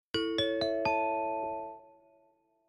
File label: feedback ding dong dang; effect; success